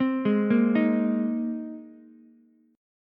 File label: A sharp 7th Suspended 2nd Suspended 4th, Ukulele chord; arpeggiated; 5,8,6,6; JGuitar